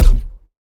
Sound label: Ball Player Hand Hit Extra Hard